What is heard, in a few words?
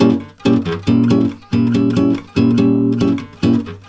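A# DIM guitar, Brazil Bossa; USB Soundscan; AKAI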